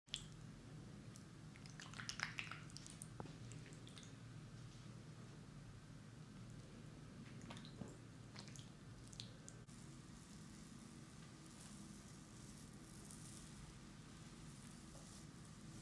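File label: head massage, asmr; ماساژ سر اسمر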